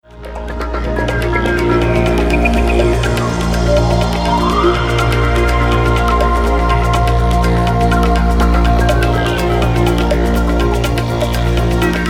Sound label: Tabla Trance; Chilled Electronic 2